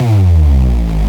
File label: Megasaw Drop